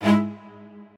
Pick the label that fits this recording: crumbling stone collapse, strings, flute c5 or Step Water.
strings